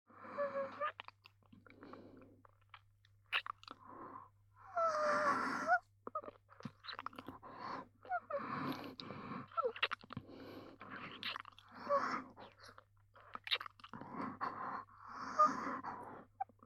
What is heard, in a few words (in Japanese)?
キスループ